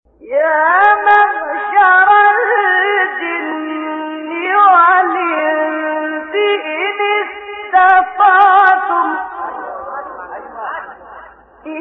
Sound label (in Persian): فراز زیبای تلاوت از سوره مبارکه; استاد حصان; الرحمن